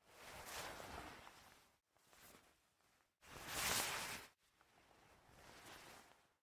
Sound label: Move Attack; raw game audio files; Mita; MiSide